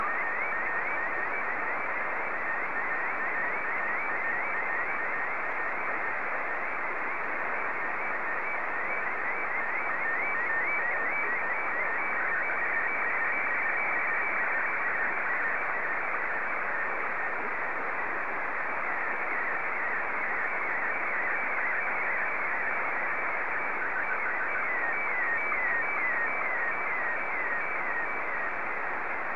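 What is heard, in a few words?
SSTV, Martin 1; ZL2TJM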